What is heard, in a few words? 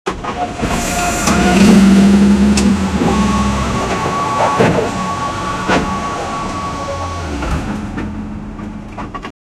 dumper truck sound loadin; construction